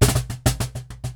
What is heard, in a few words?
Cajon Fill; musicradar